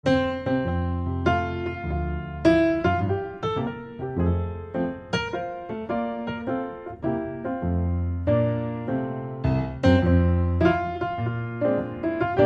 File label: The Twelve Days of Christmas Upbeat; Trad (PD)